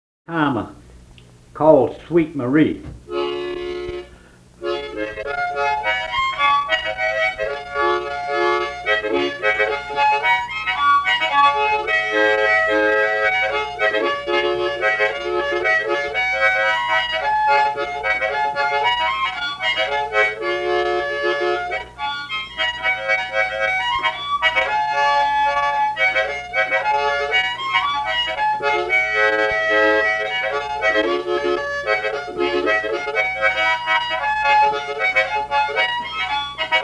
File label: Sweet Marie harmonica